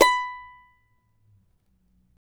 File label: charango B4; Spectrasonics; Hans Zimmer Guitars VOL-2